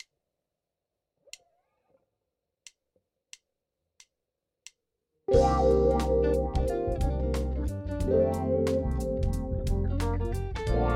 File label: Wah Guitar slow triplets for smooth jazz